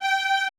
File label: G4 R, Orchestra; AKAI Advance Orchestra Upgrade 97 Vol.1